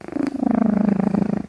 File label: purr short